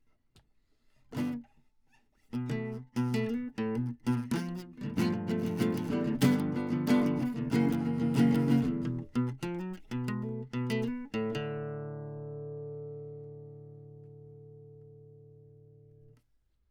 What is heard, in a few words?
Classic Guitar